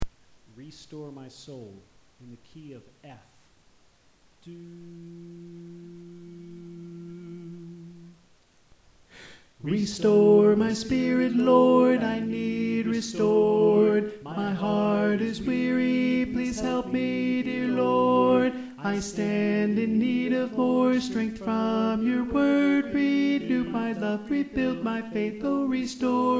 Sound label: Tenor